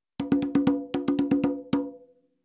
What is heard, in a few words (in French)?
frise, congas